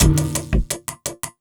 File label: LOOP, Drum & Bass, 170 BPM